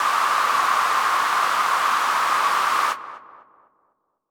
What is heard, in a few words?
NOISE; rekordbox; OSC SAMPLER; PRESET ONESHOT